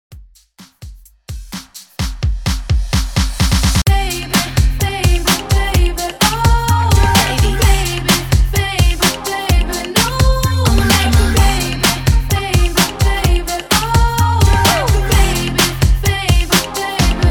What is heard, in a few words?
Hype 128 BPM; REDRUM